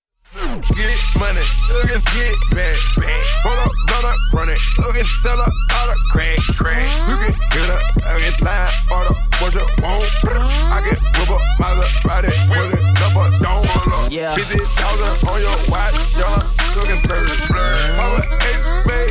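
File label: djembe clicks whistles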